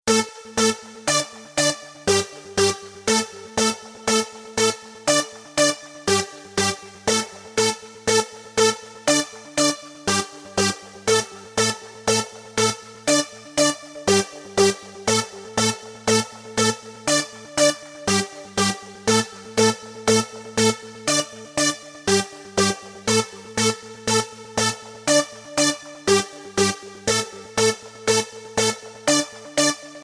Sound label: only melody